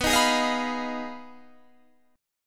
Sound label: B 6th Flat 5th B down Guitar DADGAD